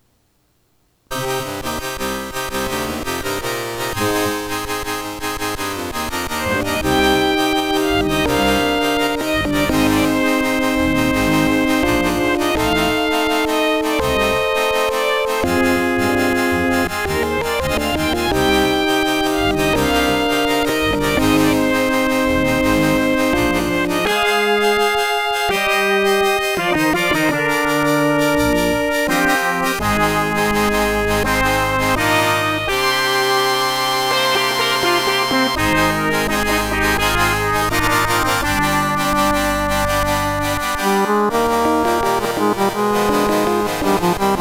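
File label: Orchid NuSound PnP 32, Sound Blaster; 44 kHz stereo FLAC recording; Speaker out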